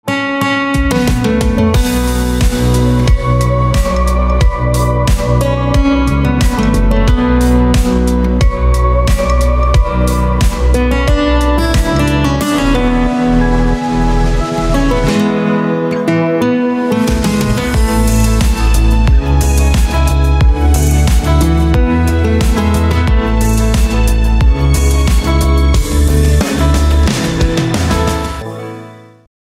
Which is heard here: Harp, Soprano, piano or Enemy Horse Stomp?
Harp